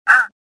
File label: choke, worker; worker1